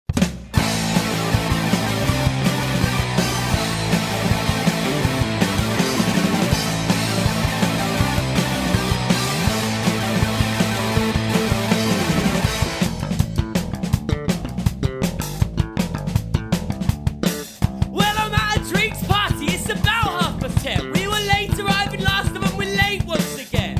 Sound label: Pop Rock